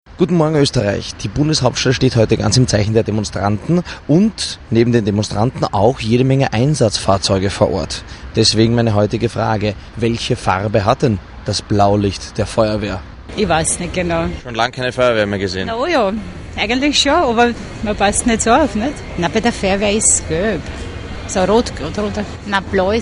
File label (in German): blaulicht